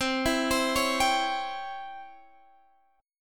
Db Minor Major 7th Flat 5th C

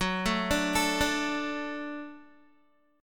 F sharp Minor Sharp 5th F sharp Guitar; F#m#5 arpeggiated; 14,12,12,14,14,x

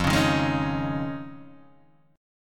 F Minor 7th Sharp 5th, Guitar, DADGAD

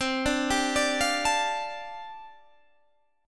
G 7th Suspended 2nd Suspended 4th C chord

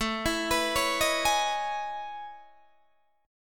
A Major Sharp 11th, Guitar DADGAD

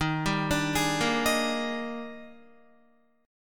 Eb Major 11th, Guitar; EbM11; arpeggiated; JGuitar

